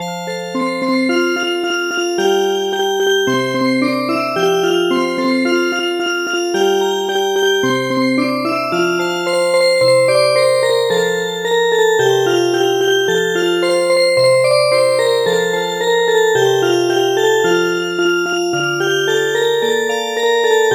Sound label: Music Box; El Rotlleto